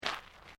FOOTSTEPS SNEAKERS GRAVEL FINE STEP; Footstep Foley; Footsteps (Sound Effects:Human)

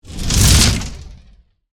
Fireball Throw, Fire, Super, Hero; Flames; BlastWave

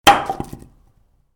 Wood, Piece Fall Onto Concrete; Materials

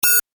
Tech beep, Beep, High Tech; Stuart Duffield

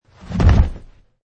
Landing; Man wearing jeans and hiking shoes jumping and landing on carpet surface - variation 26; Wrestling and Struggling; Michael Huang

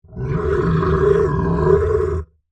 Monster Groan, Horror, Monster, Groan, Creature; Monsters (Sound Effects:Horror)

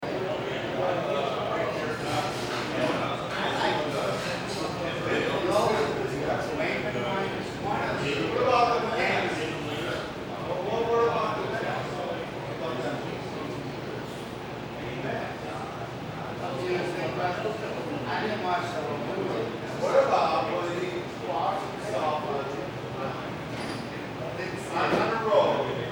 Barroom; Barroom Ambience , Man Talks Loudly .; Bars and Clubs

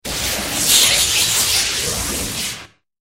Sci Fi Energy Bolts Electricity Electrical Beam; Laser

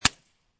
Suppressed Pistol Amphibian Bridge; Pistols; Guns; VRSonic

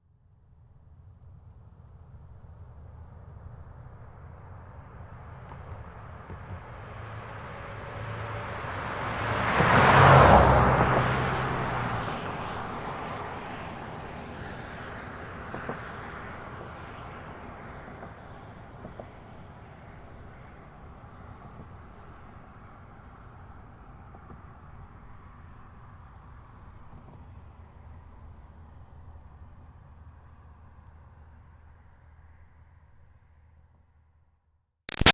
Car Highlander By Med-Fast; 2005 Toyota Highlander V6; Cars; Vehicles; Big Room Sound

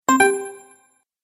Game Sparkle Sounds; Video Game Sound Effects

